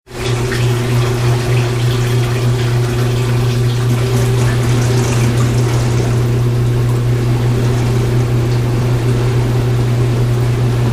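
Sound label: Washing Machine Rinse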